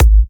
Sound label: Kicks G#; markus hakala